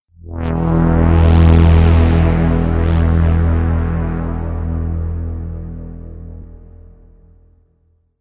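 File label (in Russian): zvuk priblijeniya opasnosti, sound of approaching danger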